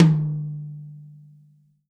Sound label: tom, Real Drum Kit, funk kit; Best Service ProSamples vol.43 - Real Drum Kits